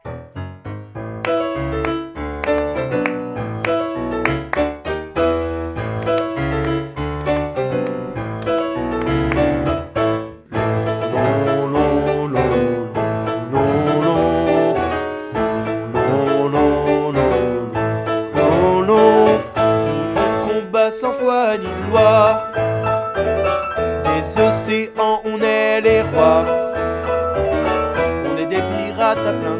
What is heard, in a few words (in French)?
pirates basse